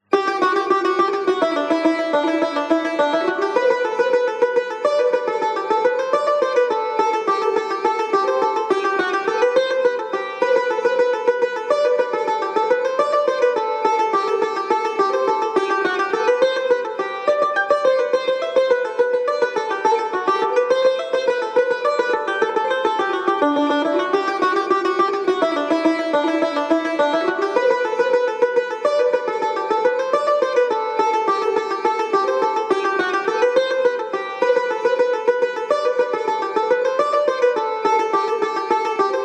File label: study of mahur tar; second book